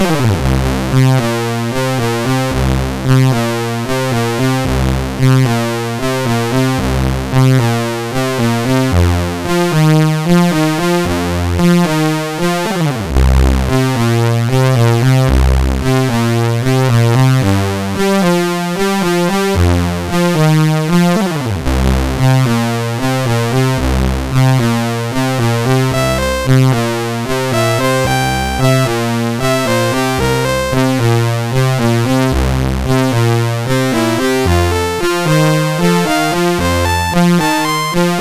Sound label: Funk Rock, sid MOS6581R4